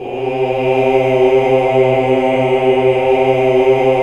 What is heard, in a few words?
OOH. OOH UNI; Hallelujah